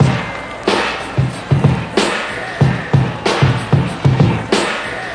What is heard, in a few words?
classic brk; VIBRIS CLASSIC BREAKS